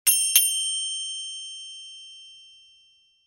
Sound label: PERCUSSION FINGER BELLS